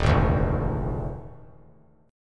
Eb Major 7th Suspended 2nd Suspended 4th Eb 3C 3F 3A 3D; strummed; 3,3,5,3; EbM7sus2sus4